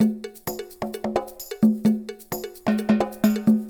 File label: Loop Percussion; drums pads